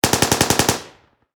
Warfare Machine Gun 7.62 Caliber M240B Long Burst Distant Perspective; SFX Bible